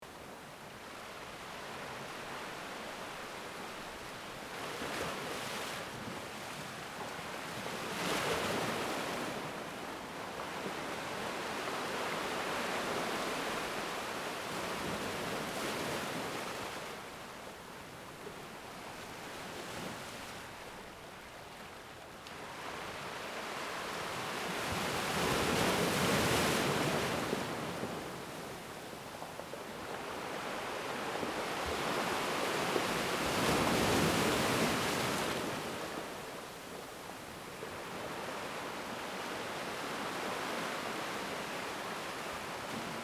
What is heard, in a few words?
ocean waves